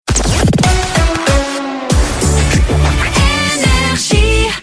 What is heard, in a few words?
Jingle; RAPID FIRE ID; NRJ; RAPID 2023